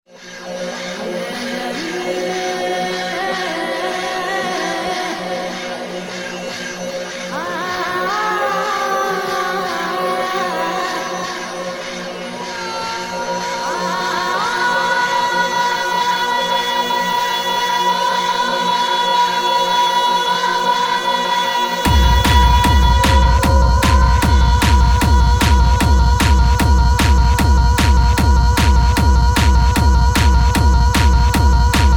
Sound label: hardstyle